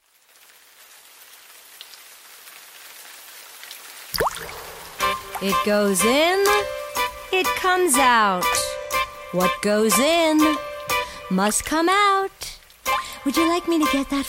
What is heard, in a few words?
Rain Song I